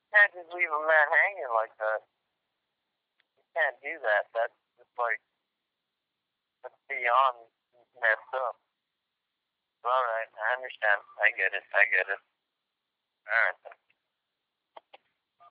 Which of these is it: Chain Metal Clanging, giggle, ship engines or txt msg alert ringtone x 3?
txt msg alert ringtone x 3